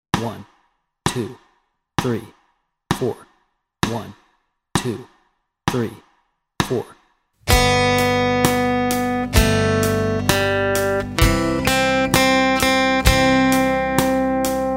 John Jacob Jingleheimer Schmidt Melody and Chords Track, guitar